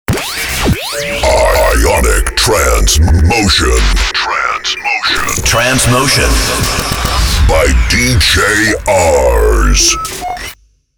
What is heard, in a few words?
jingle ionic trance motion; Clubs; Jingles